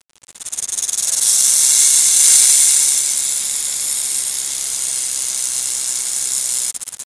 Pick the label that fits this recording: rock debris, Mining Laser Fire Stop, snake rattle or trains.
snake rattle